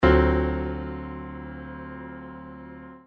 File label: D7 raised 9, jazz piano chords